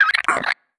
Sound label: burrow in grunt, headcrab; headcrab classic; vox